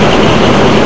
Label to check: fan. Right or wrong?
Right